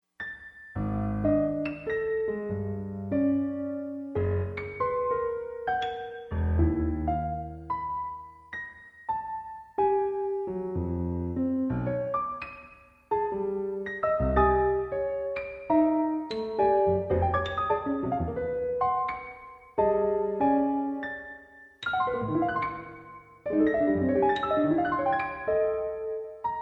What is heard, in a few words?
Piano